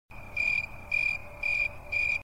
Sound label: Cricket, alarm sounds; Grille; Milestone 312